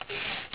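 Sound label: TYPEWRITER RETURN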